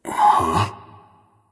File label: confused; smite; ares